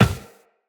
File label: Run Glass, Footsteps; Tenno